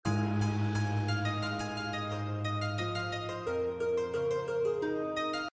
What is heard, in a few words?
BGM Magic Number; slots; Panda Majesty2